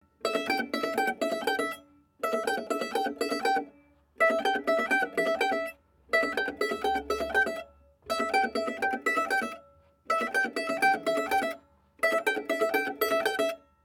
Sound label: Pick, Olofonia